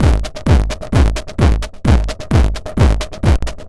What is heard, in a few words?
Crushed Beat 130BPM; musicradar